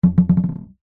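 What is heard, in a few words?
leisure ball rubber gentle bounces on hollow wood surface boomy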